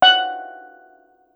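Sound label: STEEL DRUM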